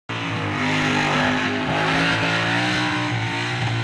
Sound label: clipping car clipping; panasonic af100